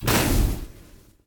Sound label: sun impact; smite; khepri